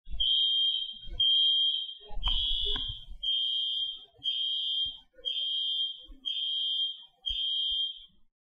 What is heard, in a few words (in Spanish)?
alarmas fuego; reconoce los sonidos